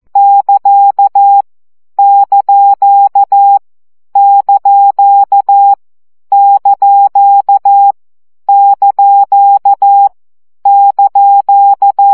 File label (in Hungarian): CW gyakorlas csuko 12 baud